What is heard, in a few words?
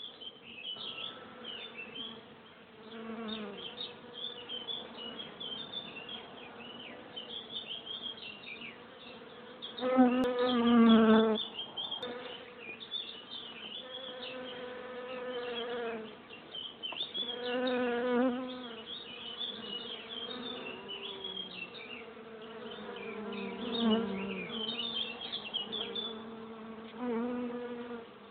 Bees in Ancy